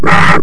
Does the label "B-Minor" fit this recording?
No